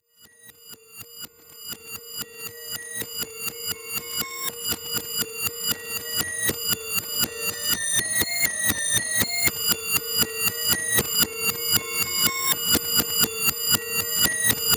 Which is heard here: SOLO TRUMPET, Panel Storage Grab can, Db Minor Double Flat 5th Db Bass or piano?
piano